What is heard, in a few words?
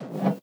BD Gate FX, Bassdrums; Vengeance Samples